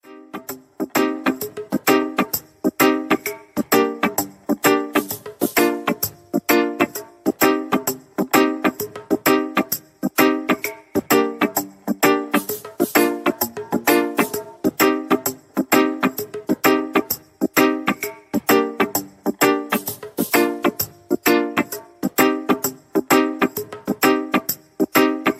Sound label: new age ringtone